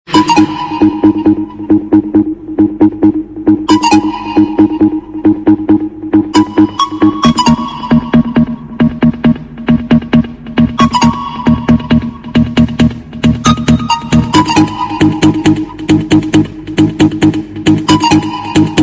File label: Vertu Loud